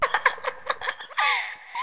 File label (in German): fieps